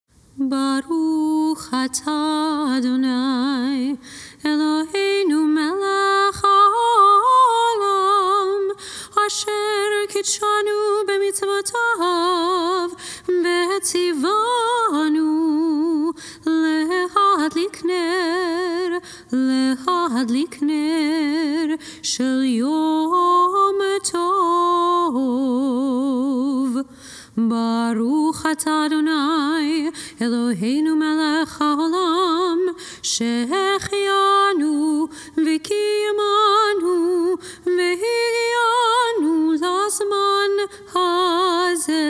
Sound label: Candle Blessing, passover